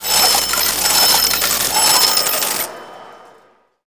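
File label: casino ambience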